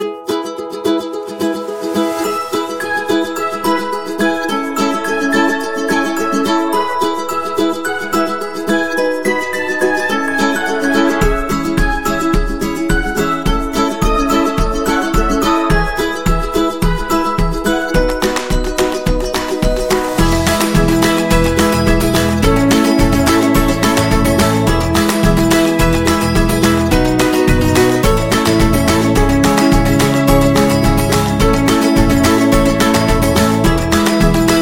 Ukulele Upbeat

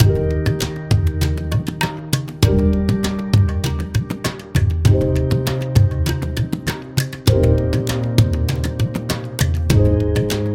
Indian Wedding

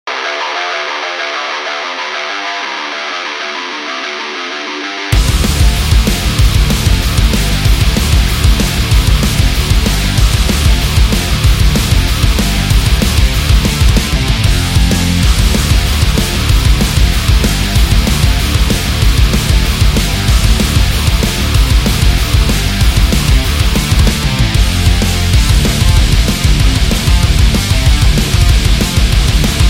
Speedy

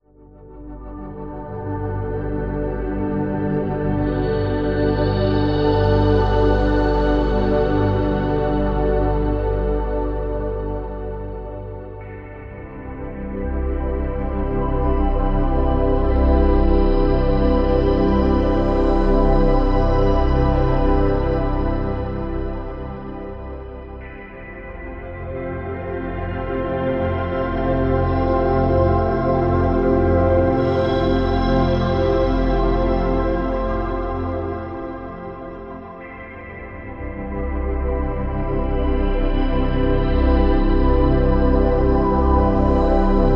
528 Hz Healing Meditation

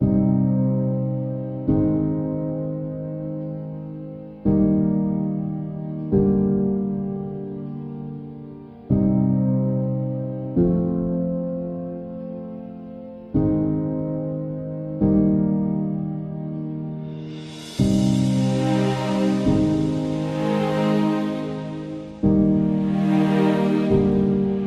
Documentary

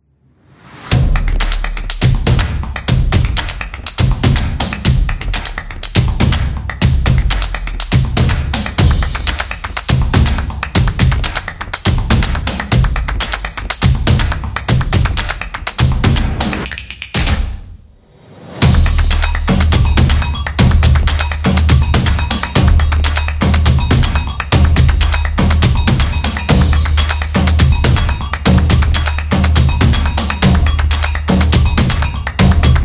Percussion Intro Vlog Opener

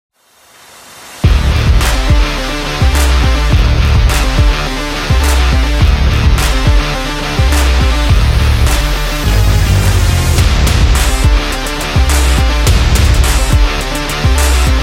Powerful Rock Trailer

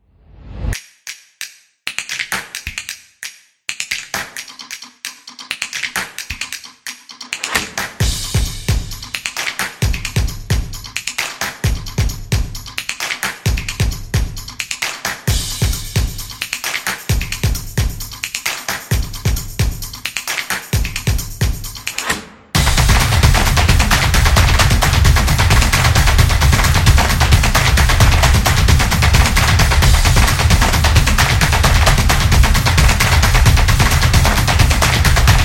Clapping, percussive

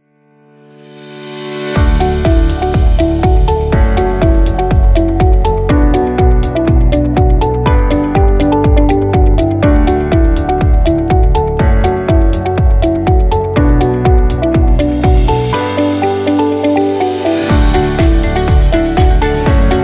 This Is Corporate

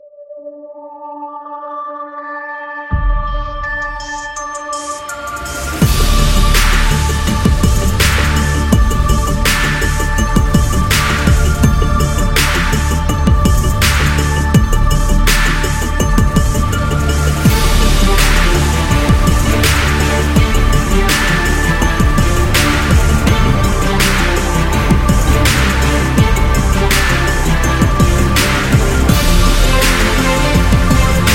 Hip Hop Epic

